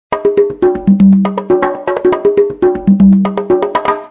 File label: Djembe; Gome; U7